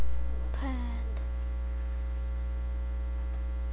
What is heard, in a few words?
pad